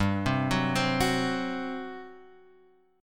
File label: G Major 7th Suspended 4th, Guitar, Open F; arpeggiated